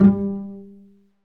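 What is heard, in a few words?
STRINGS, Cbs Pizz; Roland L-CD702; Cbs FX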